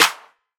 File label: subtle reverb hand clap sound g sharp key